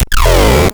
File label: Cwejman S1 Arcade Torture Snare; 300 Drum Machines; DevSnd Cwejman S1 - JK